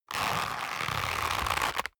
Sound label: leather creak medium